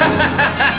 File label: data laugh